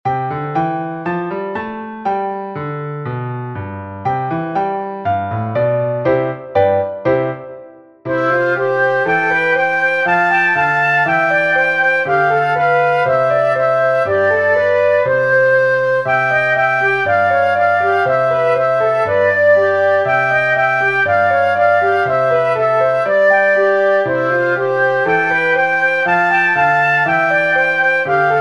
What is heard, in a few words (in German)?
Morgen kommt der Weihnachtsmann Klavier; Besetzung mit oder ohne Klavier; Schwierigkeitsgrad: 1 - Sehr Einfach; Tonart: C-Dur